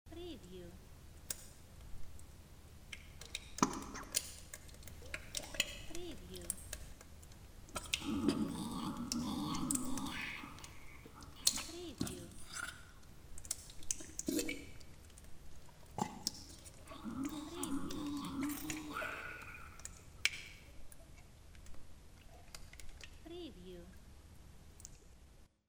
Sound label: Water Monster Merman